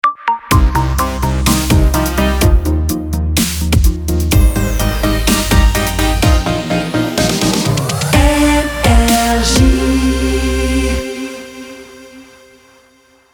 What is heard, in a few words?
Jingle Ramp; NRJ